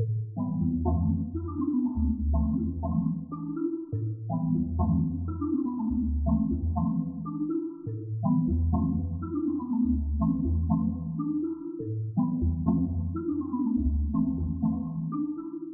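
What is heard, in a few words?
Pluck Loops A minor 122 BPM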